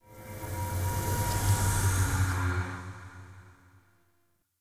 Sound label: Swarm Screen Scan Start; Entrati